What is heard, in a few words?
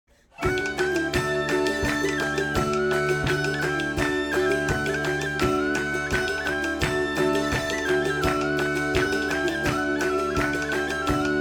Tavern Theme; Rushlight Festival (Tavern Theme 5); Pathfinder Kingmaker